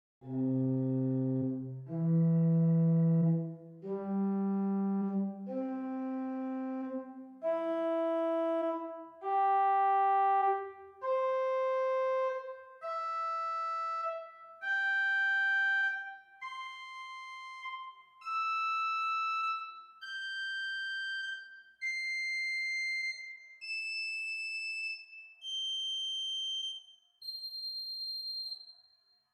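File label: Principal 4 arpeggio, organ; St Annes Moseley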